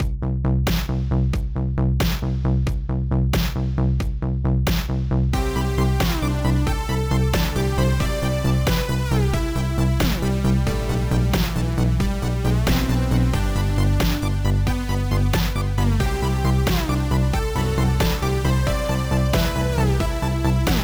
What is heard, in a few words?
synthwave; syntax25